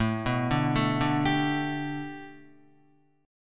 A 7th Suspended 2nd Suspended 4th, Guitar, A7sus2sus4 chord; A7sus2sus4 arpeggiated